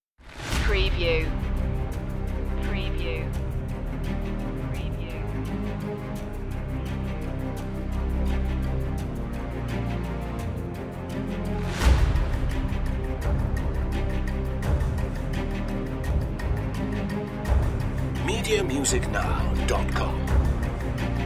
bad moon no choir

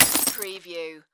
Break Glass

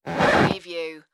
creaky floor

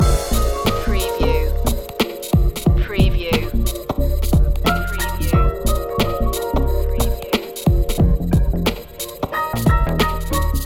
smooth jazz funk loop

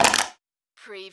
Button Sound Metal Can Crunch

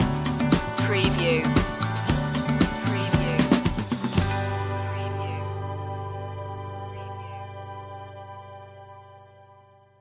stinger

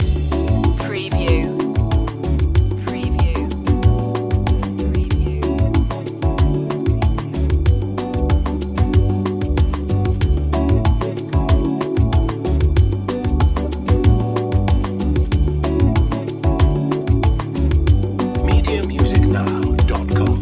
smooth bossa nova loop

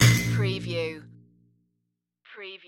menu error notification

